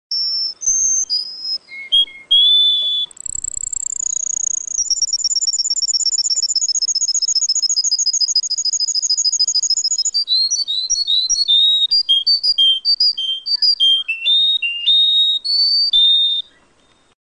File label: canary sound